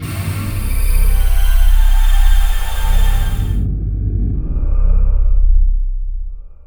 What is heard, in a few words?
SEA HORROR, MONSTERS; Zero-G Ambient 1